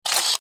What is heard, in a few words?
camera